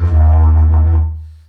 Didgeridoo Kit Samples

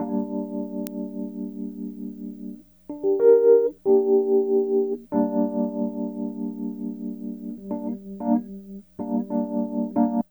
Hip Hop and R&B; Best Service ProSamples vol.02; AKAI

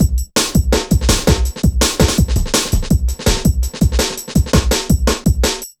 Programmed Stepz, Jungle Drum Loops; Zero-G Jungle Warfare 1, 2 & 3; 90 s Sample CDs

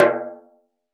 Dof Rim, Roland BK-5 Percussion

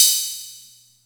Music Percussion Computer HH open, Drum Machines; Atlantex MPC-1

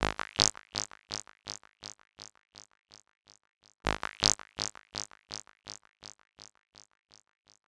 Fx Syn Loop 125; Equipped Music

